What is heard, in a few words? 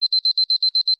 player critical; theGoonies; Retro